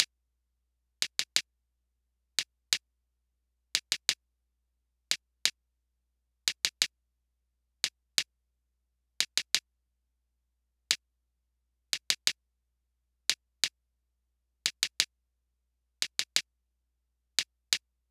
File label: Drums Kicklayer 88 Gmaj